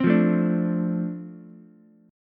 F 6th F down Ukulele; F6 strummed

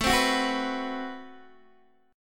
A Minor 6th Add 9th A Guitar DADGAD

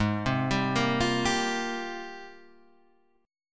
G sharp Major 13th, G#M13 chord; arpeggiated; 4,3,5,3,6,3; Standard

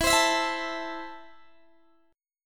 E Suspended 4th Sharp 5th E, Guitar, Open D; down

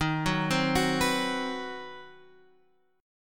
C Minor Major 7th Flat 5th D sharp, chord

